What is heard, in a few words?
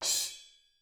ZILDP MALLET; ILIO; Double Platinum Drums 1